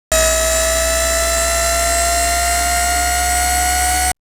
Zoomin; Samsung SGH-Z540

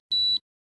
camera Countdown Slow; ZTE Nubia-Z5S-Mini

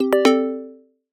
Opal bell; Google; Google Sounds; Gems

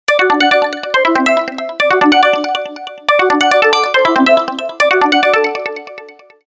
Waterdrop Tone; Samsung; GT-S8003 India

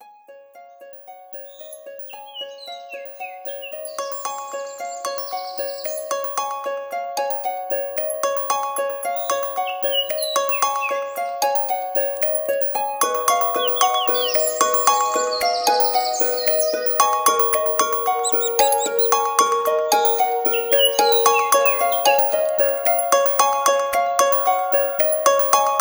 Alarm Flush Of Dawn; Vivo; X3S W; alarms

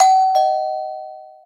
notifier Doorbell; ZTE Open-C

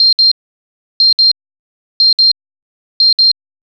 alarms; Wisteria; HTC One M8

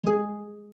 Orchestral leave application grid; Nokia; E72; Audio themes

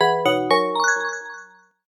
Alert Fine, notifications; Sharp FX Plus

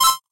Helium; Itel it1406; alarms

